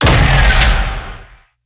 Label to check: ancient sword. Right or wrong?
Right